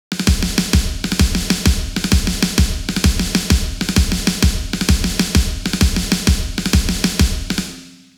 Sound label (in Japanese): ラタマキュー